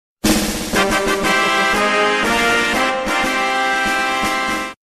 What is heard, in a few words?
victory fanfare ringtone; ffix